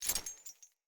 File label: Melee Swing Chains; Characters; Enemy; Foley; Sinecure; Melee Grunt